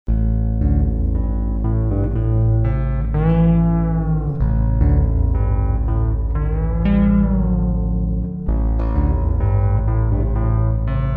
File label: Baritone Fretless